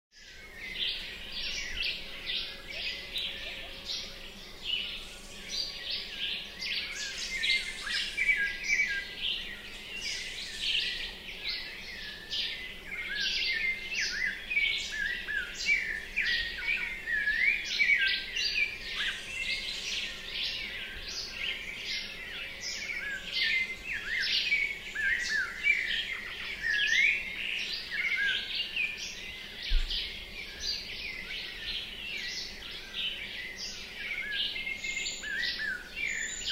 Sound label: dawn recording bird chorus Punta Laguna Mexico